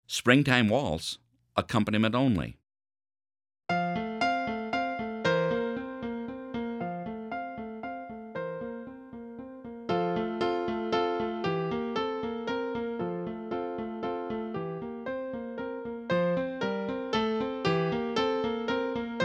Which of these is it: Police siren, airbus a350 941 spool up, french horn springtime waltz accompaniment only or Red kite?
french horn springtime waltz accompaniment only